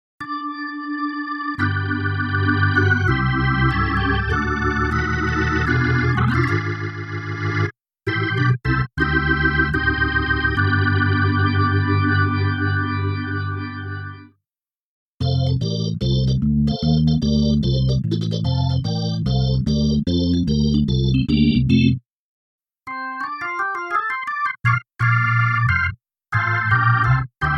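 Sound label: Kronos Solo Gospel, organ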